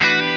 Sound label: stabs hits; Melodic Stabs and Hits